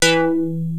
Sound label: PPG metal E3, PPG Wave 1; Greytsounds VOL-1